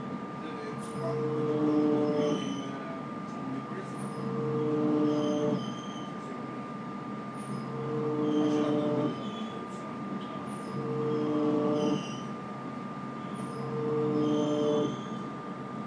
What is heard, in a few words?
the tube train i'm on sounds like its being powered by a dying seal